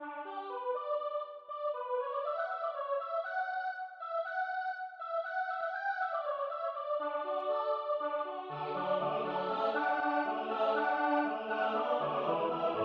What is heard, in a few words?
tutti; haste haste to town